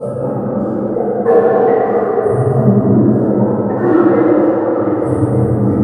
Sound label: SAD GROOVE; USB Soundscan vol.33; AKAI; Disjointed Textures